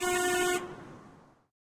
Round Start

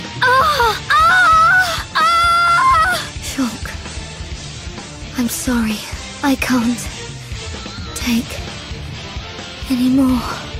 aaaah; fiora; Fiora Screams for Shulk

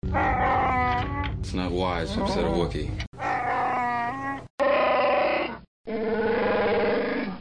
chew

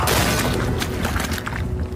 hit; jjba

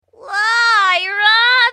meowing

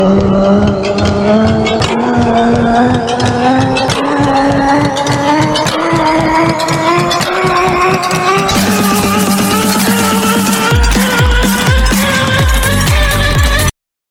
hardest bass drop

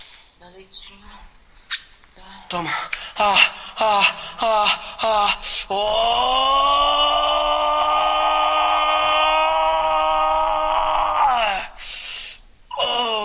sirene

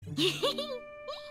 noises; gabriel dropout; satania

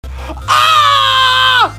retro scream; WetwoScream

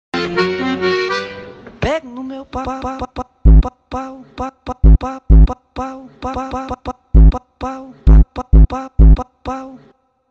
funk

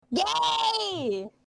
screaming gay spleens

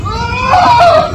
retro yell, Uwaah

